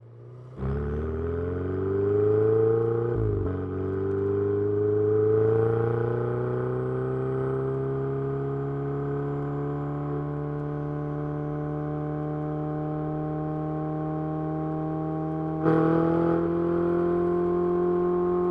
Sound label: ferrari 488, onbrd, drive, steady RPMs, exhaust, left; Pole Position